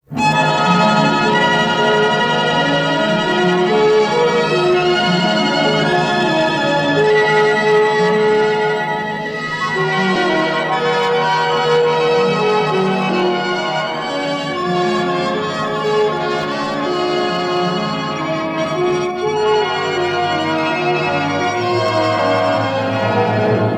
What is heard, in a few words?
Lab Mayhem; Panorama Narrator